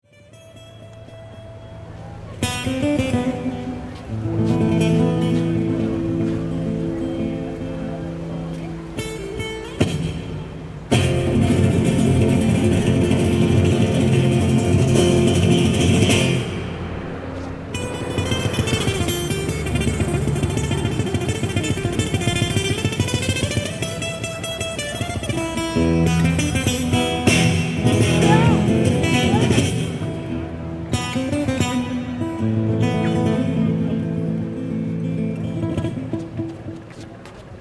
Ambience Busker Manly Flamenco Guitar, Busker on Manly beach Sydney; Concert Sound Effects; Concerts (Sound Effects:Backgrounds); Foundation1